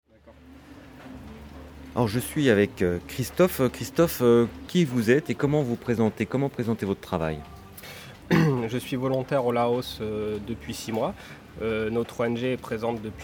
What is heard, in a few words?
EDM